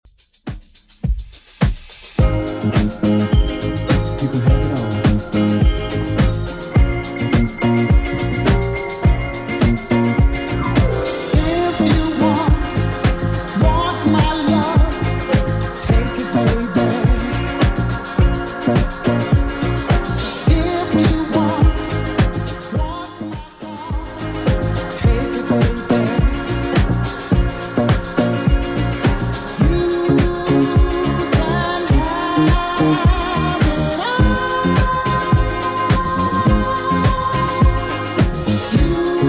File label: 70s Redrum, 105 bpm